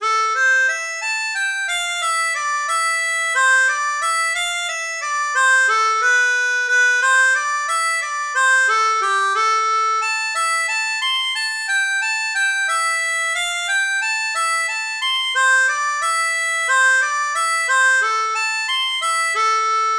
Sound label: Dance, harmonica